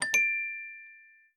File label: block chip minor